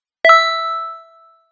text receive

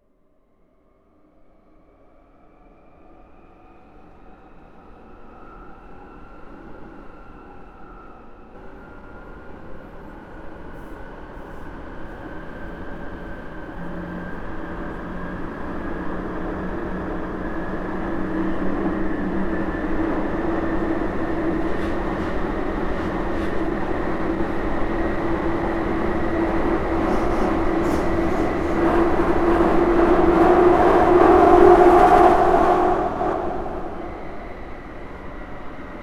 Tube train; Sound design for the opera composer: concepts and methods; Vicky and Albert audio cues

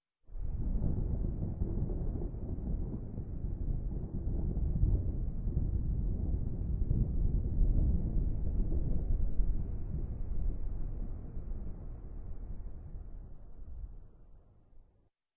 thunder; Sound design for the opera composer: concepts and methods; Her face was of flowers audio cues

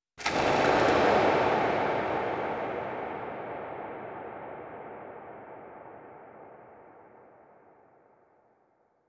birds take off; Sound design for the opera composer: concepts and methods; Her face was of flowers audio cues

